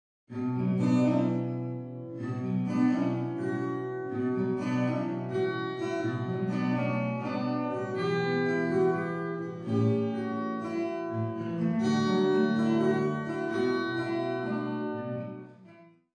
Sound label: Wet Reverb Only